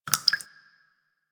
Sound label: Water Drips; Warframe; Quests; The New War; Ambience; Zariman